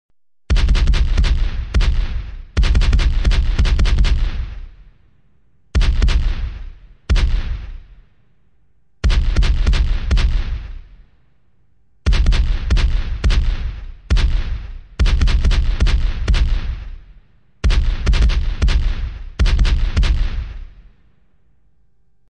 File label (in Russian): Pushka odinochnye vystrely seriey